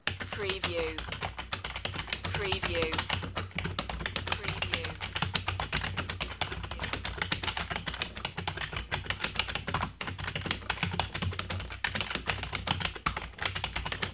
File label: Keyboard Typing Quick